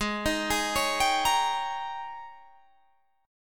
G sharp Major 7th Suspended 2nd Suspended 4th Guitar Open G